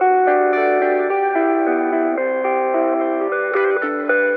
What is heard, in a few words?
guitar, Loops, Phonky